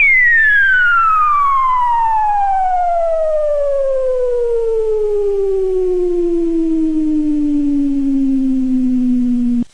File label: computer, Computery or Science Fiction